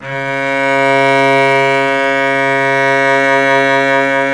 SOL CEL 8 R, Synclavier Strings; ILIO

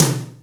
TOM; Best Service Gigapack 2

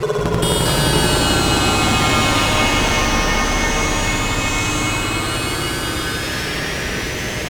Long Decay, FX

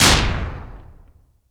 gun, gun shots; AKAI S-Series CD-ROM Sound Library VOL-6

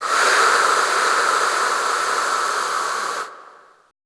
VOICELESS, Choir; Best Service; Extended Classical Choir

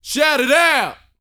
VOCAL, R&B Dance, HIP-HOP

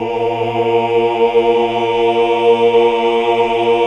OOH, Hallelujah

